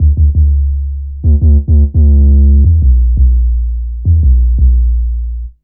Raw Bass, Drum & Bass; Fluid Dynamics

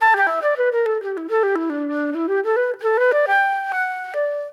Fusion Flute; Sonic Foundry (Sony Creative Software); Manhattan Flutes; Garage Funk

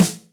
Roll Em, Electric Snare, Yamaha DTXpress

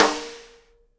snare RIM RING, Live Snares; E-MU Producer Series Vol. 8 – Platinum Phatt (CD 1)

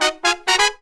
Phantom Horns, 132BPM; Zero-G

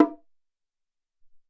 Lo Bong

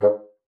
bassoon, G#2; Xsample VOL-6

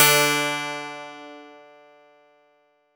D2, PIANO; AKAI S-Series CD-ROM Sound Library VOL-8

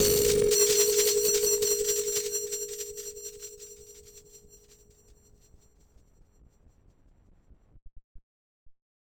Battered Synth; malfunctioning synth samples

